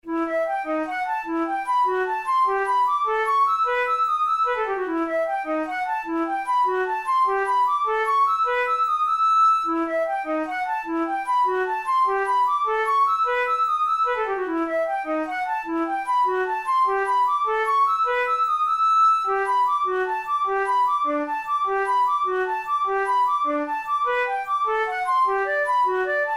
giga, flute; braun; pieces sans basse no2

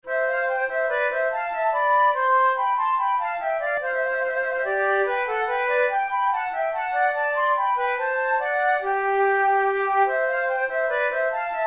menuets; Naudot; 6 babioles

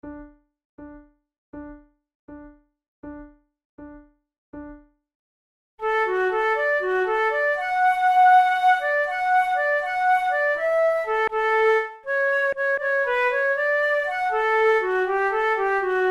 auber diavolo duet, flute

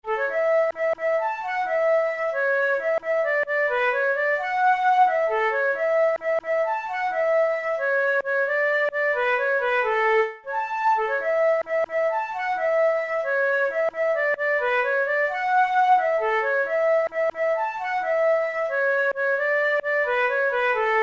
blue eyed mary, flute

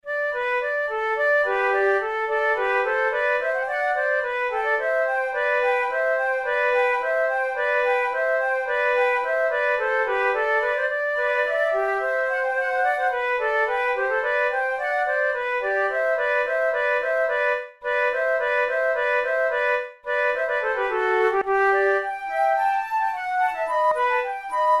allegro, flute tunes; loeillet de gant op5 no3 v

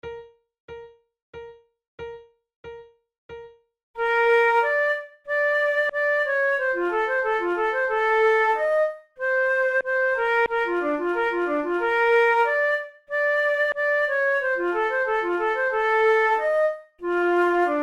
duet in b flat major, flute; kohler op93 no1 duet no6

